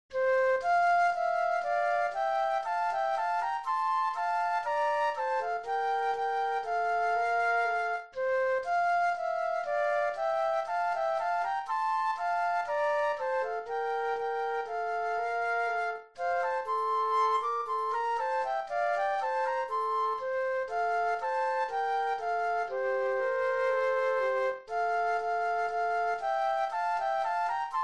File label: Flute Duet